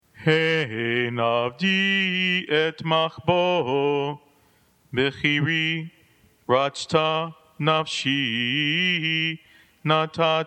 haftarah

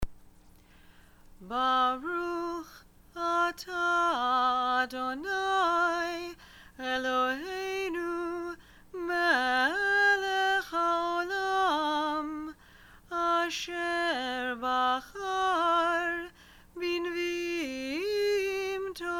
Blessing Before Haftarah

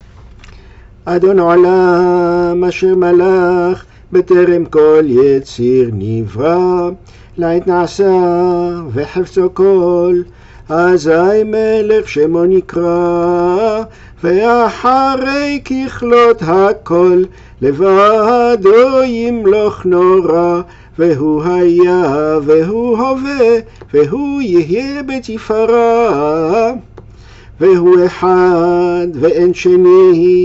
Adon Olam sung; Kemp Mill

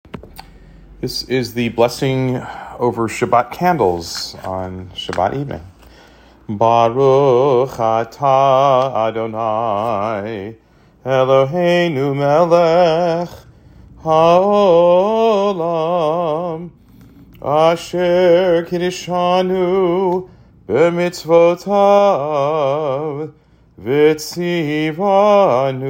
Shabbat Candle Blessing; Kadima

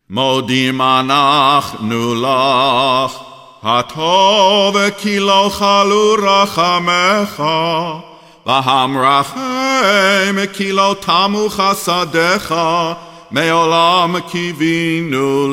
modim; Cantor Recordings; Saturday

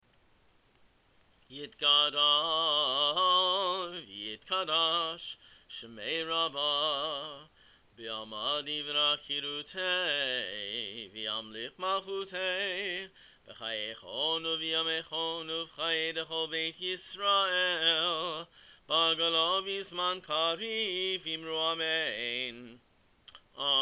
kaddish le musaf; Cantor

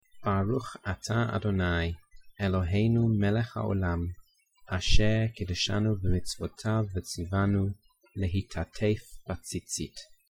Tallit blessing

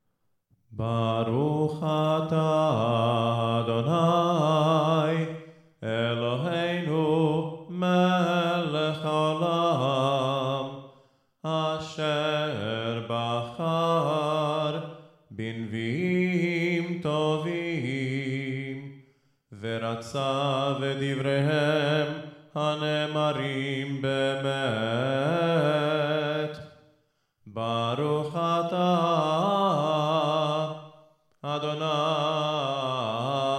haftarah blessing before reading

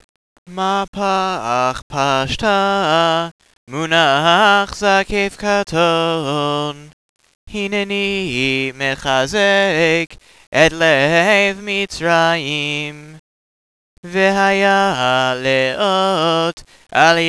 Mahpach pashta munah zaqef qaton

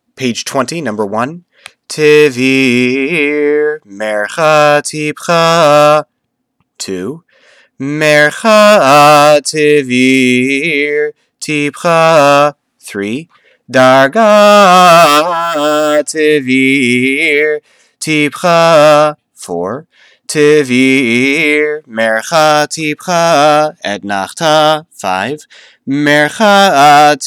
Tevir

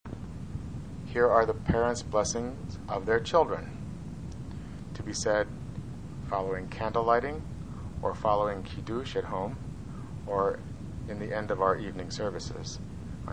parent blessings; Music Shabbat Morning

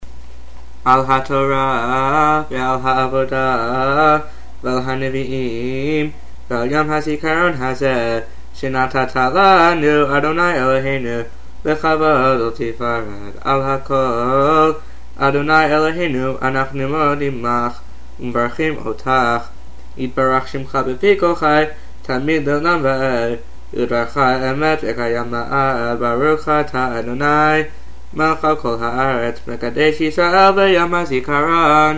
Bracha after haftarah; RH Day2

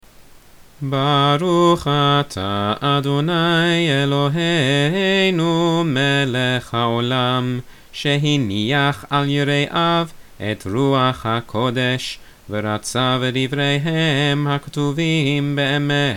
Haftarah before; ktuvim

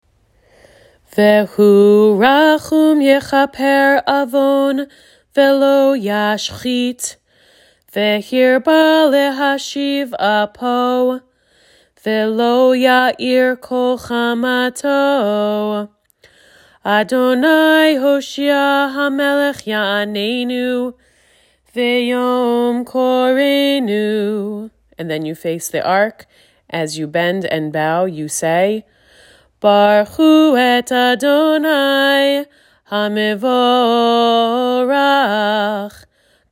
Vhurachum barchu

